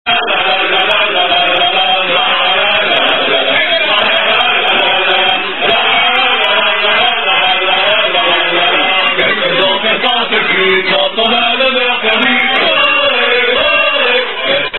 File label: Chant, French Rugby Song; Rcdr